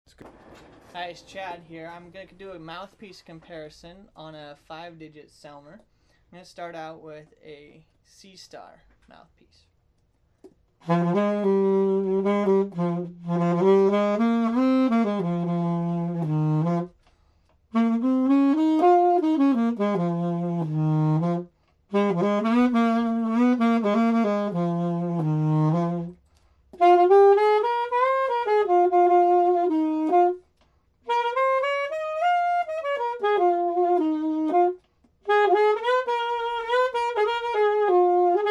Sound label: 3 mouthpieces, sax